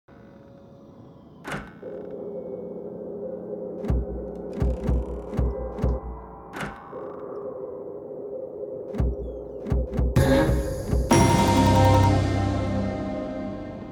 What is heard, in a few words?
audio slot